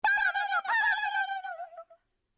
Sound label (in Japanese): 入店のチャイム